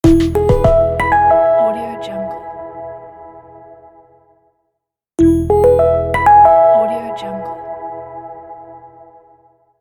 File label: motivational light logo